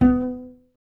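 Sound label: string contrabass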